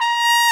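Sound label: trumpet; Roland L-CD702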